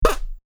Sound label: zoop